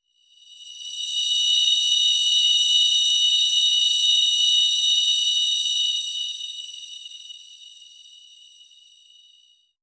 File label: a shimmering arcane light